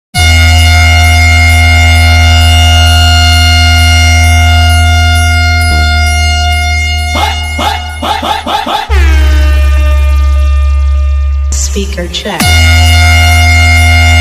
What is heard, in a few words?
Train Horn Beet Hard Vibration Dailouge Mix